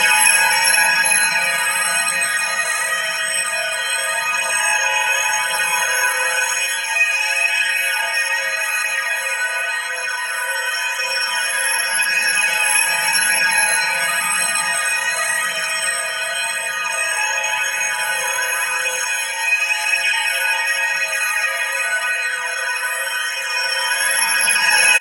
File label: warrior light remote loop